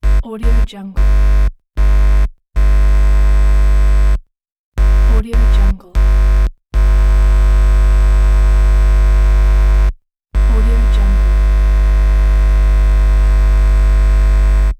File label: guitar cable noise